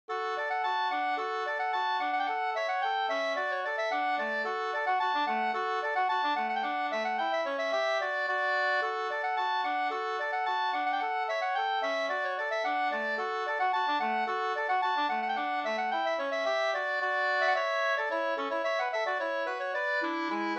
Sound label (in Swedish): polka, 2st